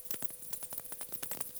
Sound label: Pipistrellus pygmaeus, batcalls, europe